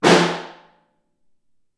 SN2 Far, snare

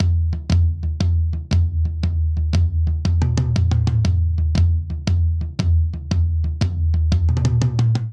Blues Shuffle Shuffle Toms 118 bpm; 4 4; MIDI Clips; Ableton; Factory Packs; Drum Booth

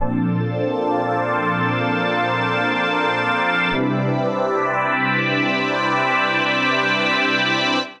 Pad (Bb) 120bpm; Music Loops; Tropos Pad; Ableton; Factory Packs; Singularities

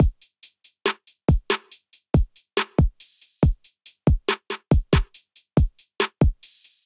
MPE Vagrant Kit, Trap Drums; Trap Drums by Sound Oracle; Ableton; Factory Packs

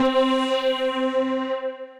Lofi Long Synth; Synth Lead; Ableton; Glitch and Wash

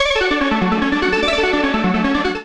Arpeggio D Major 84 bpm; MIDI Clips; Melodic; 16 Pennies; Ableton; Factory Packs; Chop and Swing

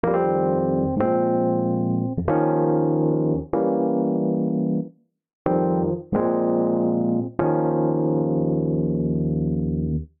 Eb Major 94 bpm; MIDI Clips; Tonal; Accompaniment; Rcrd Plnt; Ableton; Factory Packs; Chop and Swing

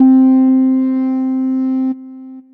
Analog Flute Lead; Synth Lead; Ableton; Factory Packs; Chop and Swing

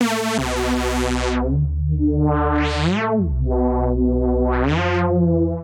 Saw Reese B Minor 170 bpm, bassline; MIDI Clips; Tonal; Skitter and Step; Ableton Factory Packs

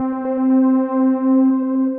Spire Pad Bass split, Synth Pad; Ableton; Factory Packs; Synth Essentials

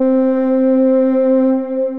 Operatic, Synth Lead

